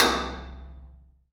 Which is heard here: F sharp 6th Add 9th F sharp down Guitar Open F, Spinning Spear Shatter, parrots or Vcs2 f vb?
Spinning Spear Shatter